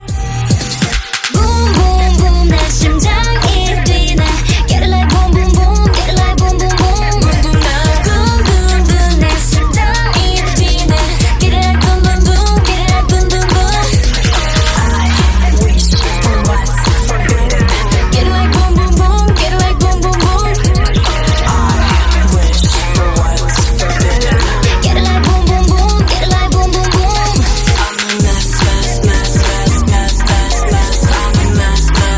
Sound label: Korean ringtone